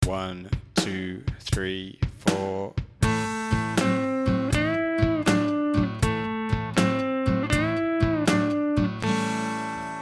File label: blues a bend, guitar